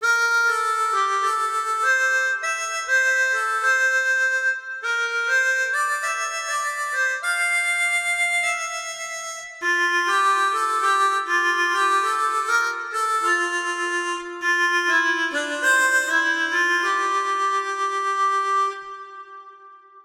harmonica

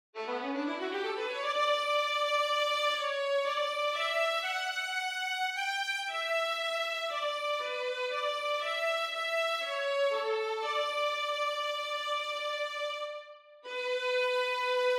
strings low; suikoden; violin

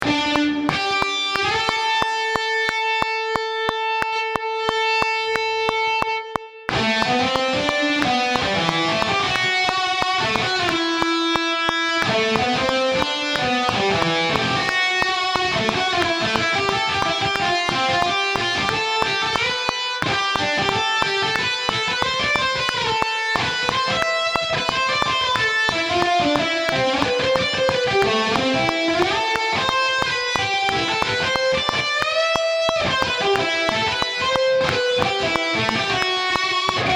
lead guitar only; Contra

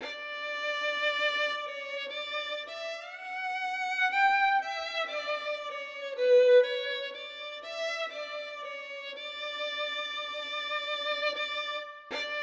violin; suikoden

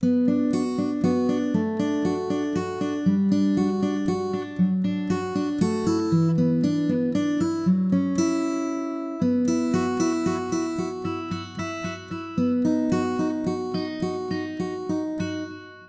guitar; suikoden

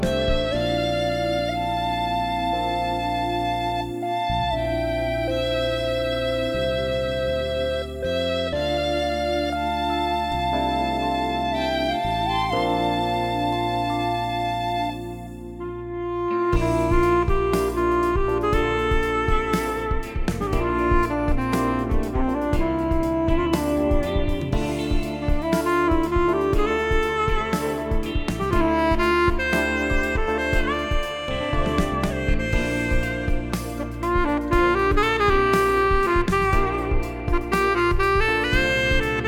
solo test, sax; chrono

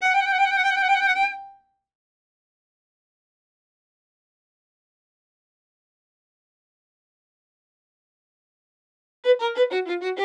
gypsy violin

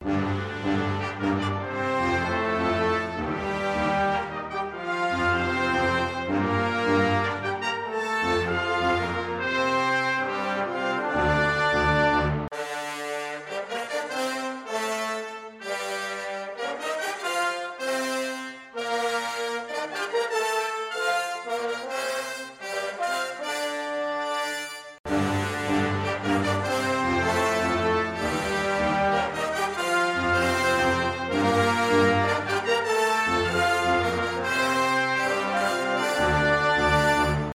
brass teaser

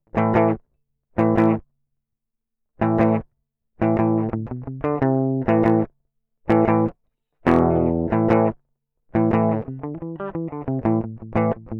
guitar; Contra

